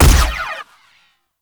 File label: Shot Fire; Warframe; Weapons; Acceltra Prime